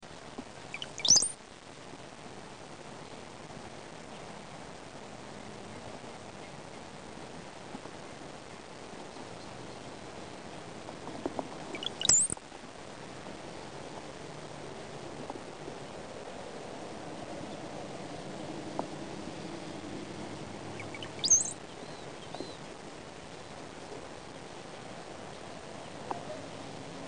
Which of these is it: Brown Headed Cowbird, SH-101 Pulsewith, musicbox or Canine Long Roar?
Brown Headed Cowbird